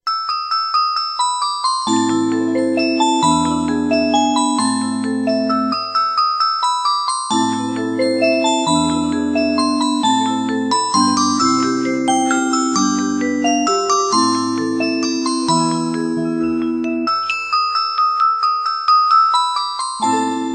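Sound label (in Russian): Fur Elise музыкальная шкатулка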